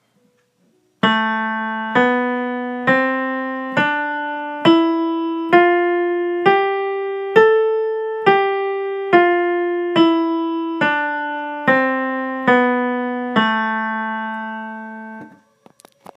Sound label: natural minor scale